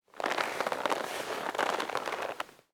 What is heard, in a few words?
MOVEMENT PEOPLE; SIMUZENSOUND